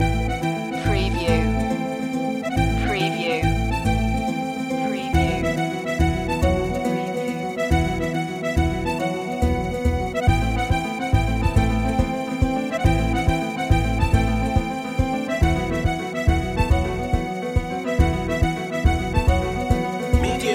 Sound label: balkan journey loop